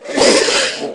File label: Spin, Small Spearleg, Aliens